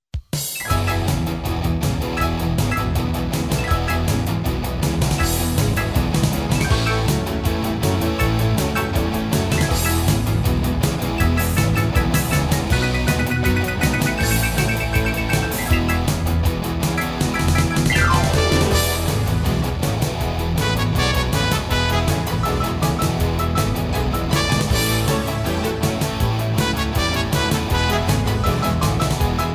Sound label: ROCK AND ROLL